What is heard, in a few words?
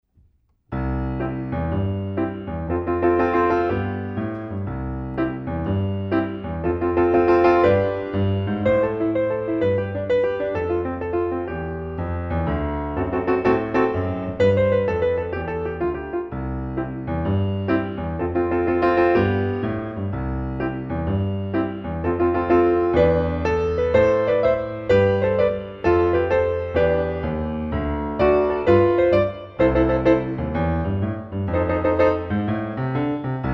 Swing prelude